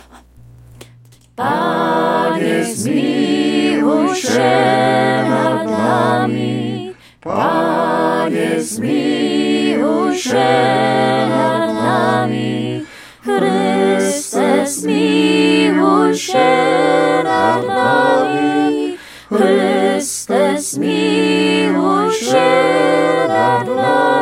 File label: SATB; Sopran Alt Tenor Bas; Panie zmiluj; Gaude Mater